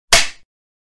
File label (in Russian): kinohlopushka